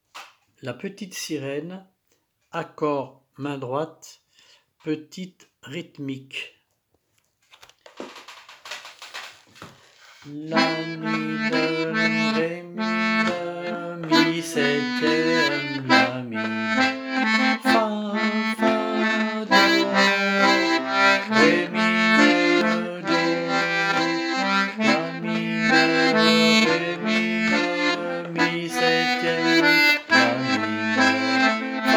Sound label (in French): Rythmique Accords